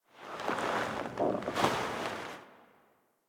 Foley Operator Turn Head; Warframe; Orbiter Nursery

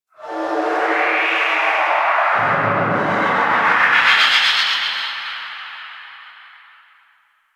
Undercroft Shriek Tonal Verb One Off, Ambience; Warframe; Duviri

